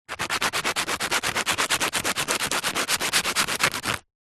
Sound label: industrial sand paper wood small rubs